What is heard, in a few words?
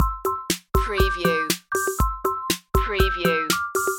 casio